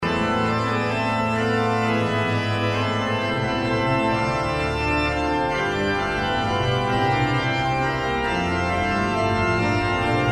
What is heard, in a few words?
Prelude and Fugue in A minor; J. S. Bach